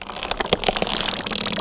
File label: barnacle tongue pull; hl2